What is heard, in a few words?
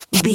footstep concrete slide